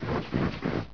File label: he idle, houndeye; Source Engine; bshift